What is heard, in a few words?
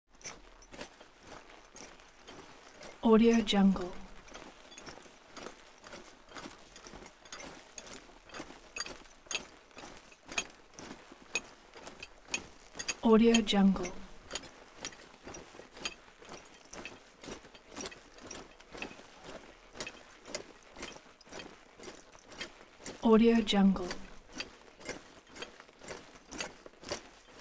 armor troops leather metal march soft; foley; smartsoundfx